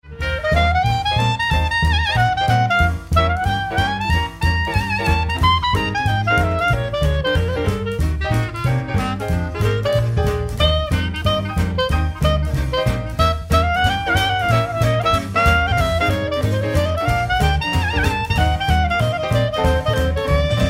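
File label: clarinet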